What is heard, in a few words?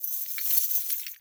Bird Three Body Shake; Warframe; Entrati